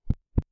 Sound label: Footstep Fast